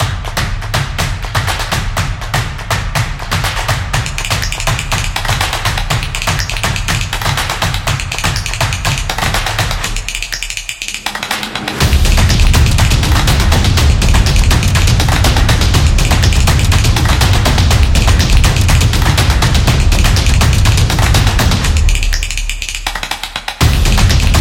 Stomping

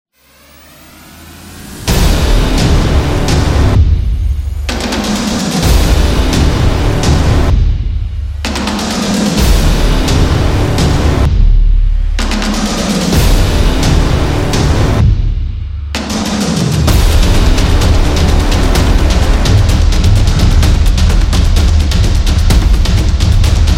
The Epic Drum, war drums

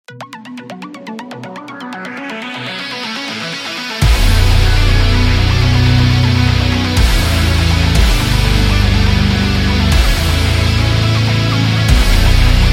Metalhead

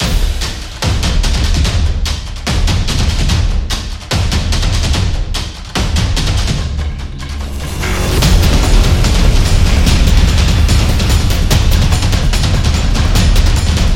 Tank, war drums

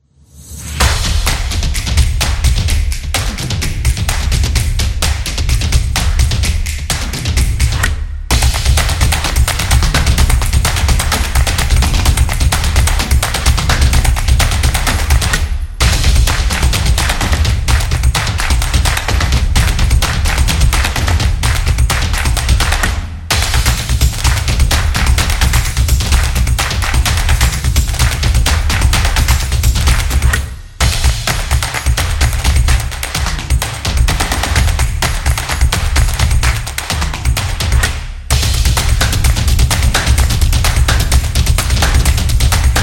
Percussion Claps

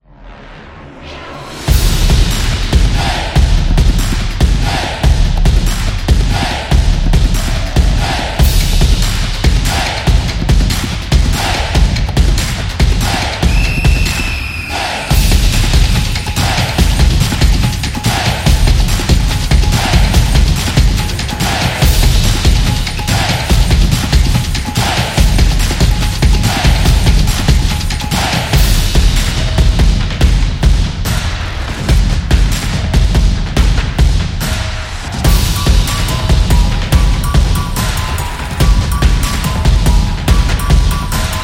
Stadium Sport Drums; percussive nation vol 4

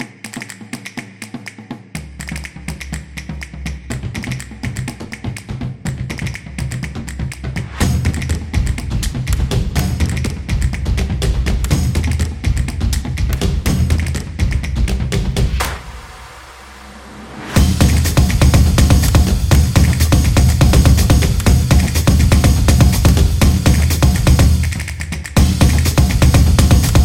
Percussion Background; soccer stadium

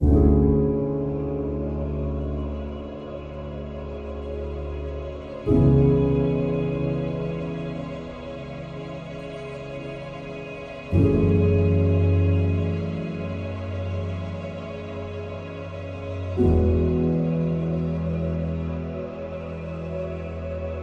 Smooth

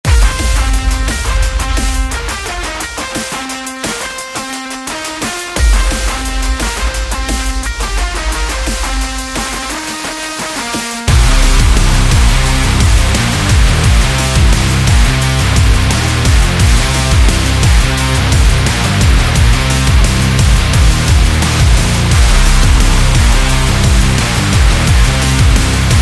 Action Sport; epic sport metal

